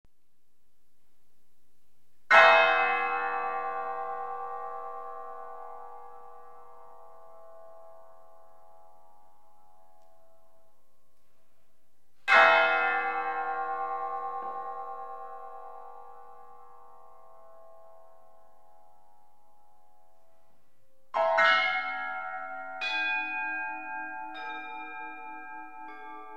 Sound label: water wood